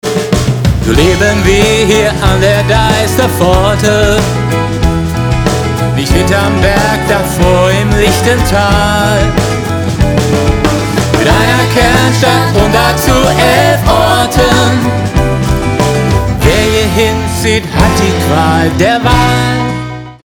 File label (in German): klingelton refrain; Springe Lied; freizeitinspringe